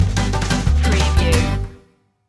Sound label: hickabilly hoedown stinger